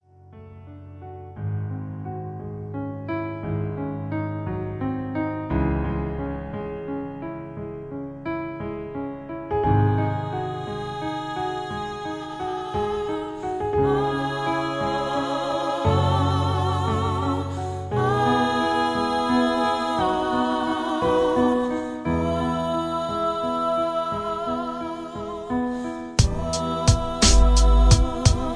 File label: Piano